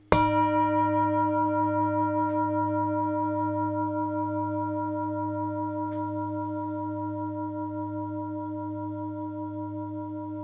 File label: Mandala 29 cm, singing bowl; klang skal